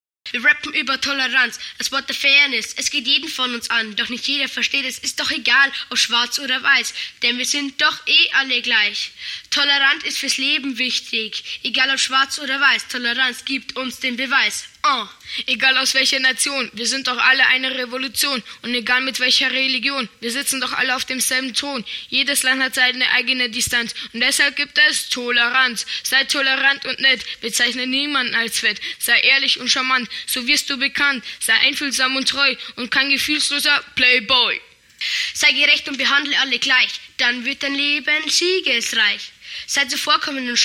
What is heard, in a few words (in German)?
toleranz rap